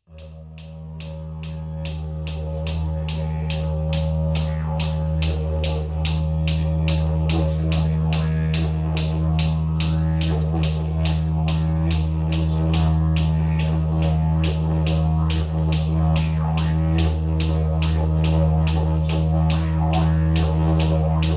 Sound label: didj and sticks